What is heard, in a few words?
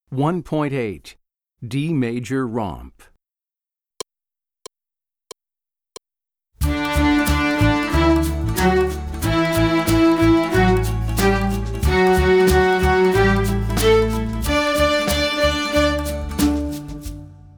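D Major Romp, String Orchestra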